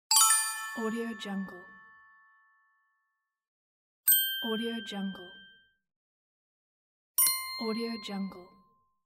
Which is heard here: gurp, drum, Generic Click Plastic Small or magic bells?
magic bells